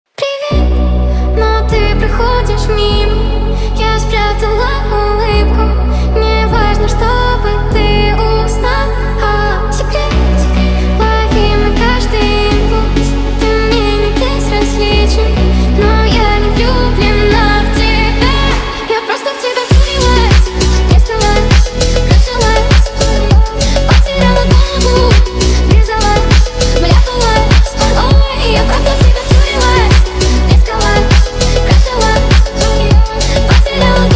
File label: phonk remix